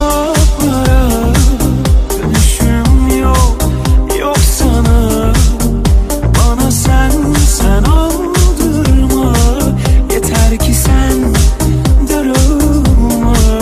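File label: turkish ringtone; tuerkish muezigi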